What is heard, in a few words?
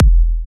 Kick; WAProd Free Kick Samples 2018; WA Kick Pack 4